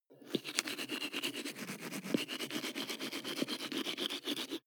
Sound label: teeth brush dry closed molars